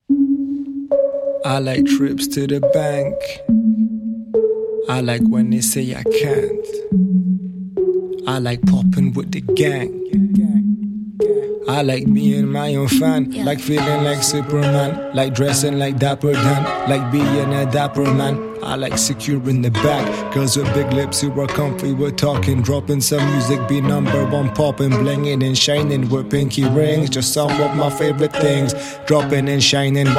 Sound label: remix